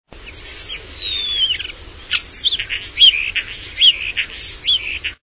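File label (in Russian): skvorec, starling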